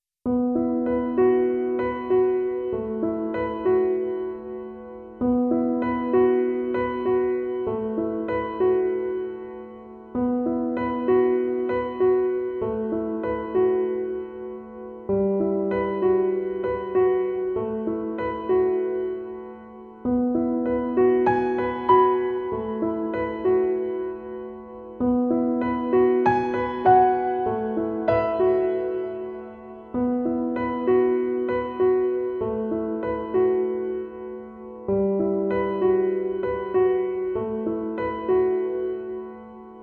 Piano Only

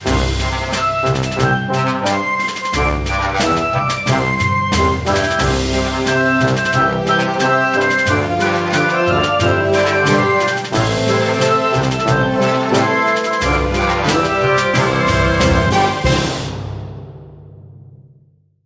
High School Marching Band

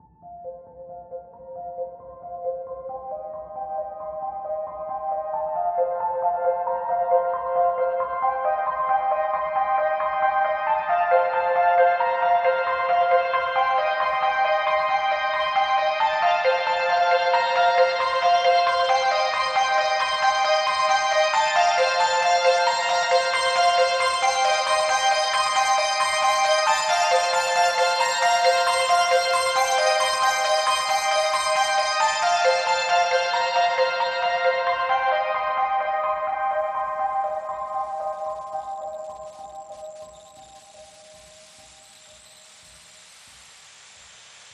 Mid Part No Drums No Bass; Bizet LArlesienne Remix

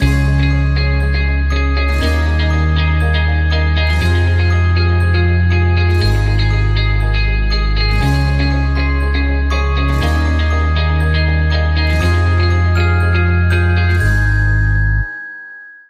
No Drums No Melody

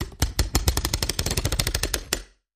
Nutcracker Toy Windup

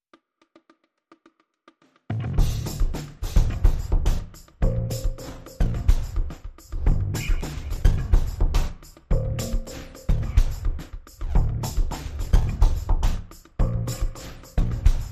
Phrase Pulse, Bass and Drums

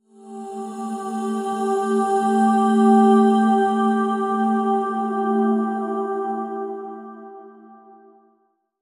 Equinox Drone, Link Sting

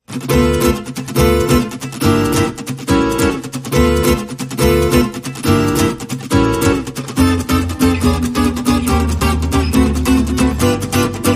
No Drums No Claps

